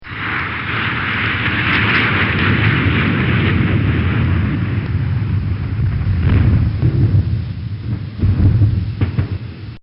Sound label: F 16 Falcon Fire Maverick Missle At Ground Target; Military; Bobby Cole